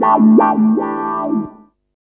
Wah Wah; Drum & Bass

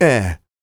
MALE HUMAN, Vocal Planet R&B & Dance; ILIO

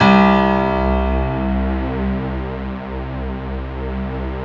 PIA Piano String; Sound & Vision; Gigapack I CD 2

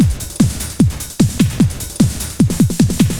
LOOP, Eurotech; Sounds Good

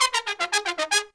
Pop & Funk Brass, L; Best Service ProSamples vol.25; AKAI

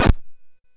Drums, Hits; DCM8

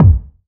BD, BASS DRUMS; EdgeSounds

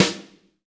DRM BRUSH, Brushes; Roland L-CD701

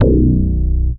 Bass B, One Shot Bass

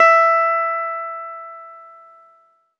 ZITHER; Best Service; Alpine Volksmusik

